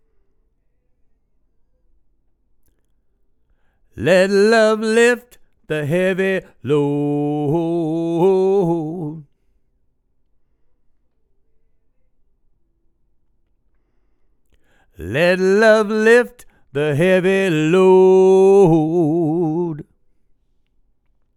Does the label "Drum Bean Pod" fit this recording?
No